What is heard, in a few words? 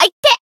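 Voice damaged, Japanese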